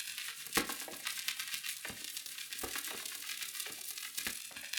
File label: Cracklature, sparse soundscape